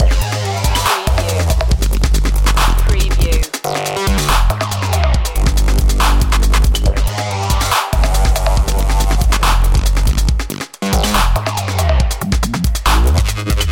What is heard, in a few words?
step 2 edge loop